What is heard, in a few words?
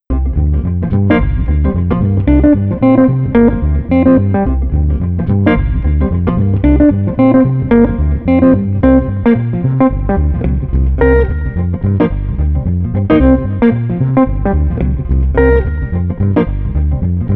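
Street Guitar Bass Swingin, 110 bpm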